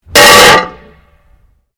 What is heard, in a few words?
Glass pane impact no break; Material components for constructing more complex sounds